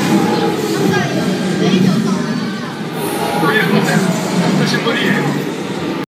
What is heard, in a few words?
ride roar; Crystal Crawler